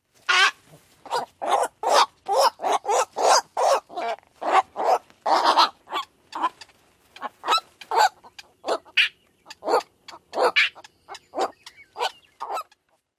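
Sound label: Black-crowned Night-Heron rookery sounds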